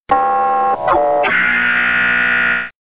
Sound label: sncf station tone